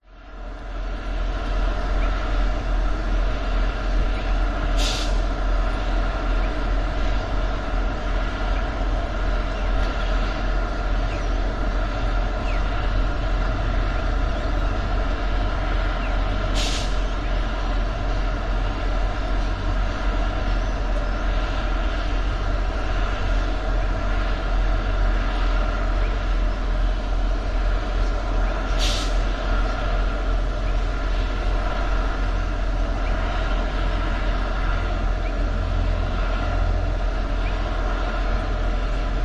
industrial cement works silo motor hum birds australia; zapsplat